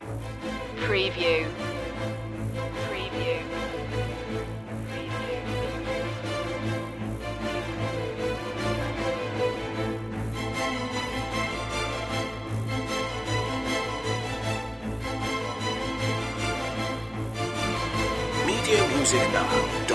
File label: strings